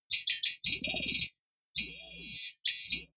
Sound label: typewriter